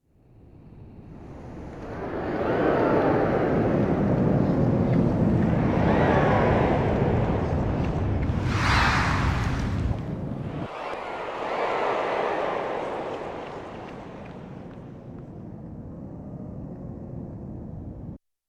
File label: frightening II, Yamaha TX16W; Sound FX